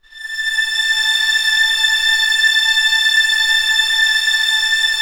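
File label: STR A5 FF, Orchestra Strings; Sound On Sound - Peter Siedleczek's Orchestra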